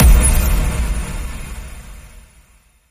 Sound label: Leprechaun Coin Hit